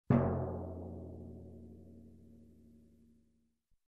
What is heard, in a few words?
Timpani Hit Single